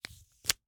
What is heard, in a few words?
Slap bracelet, smack, slap, whip, felt, plastic, unravel, slip; Eiravaein Works; Vaeyan IV